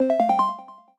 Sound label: autoplay on; Navy Girl